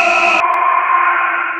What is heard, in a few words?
man pain; delugeman